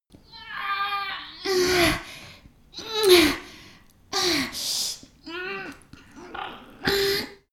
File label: female exert; koerpergeraeusche; menschen; soundpool